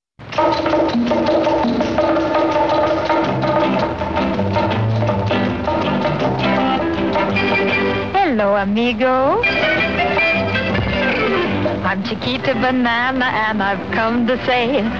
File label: Jingle